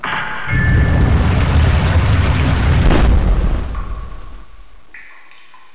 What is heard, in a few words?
egg win; SecretsJ DEMO; KING